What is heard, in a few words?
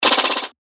percussion; Quackery; revealed percussion vol 3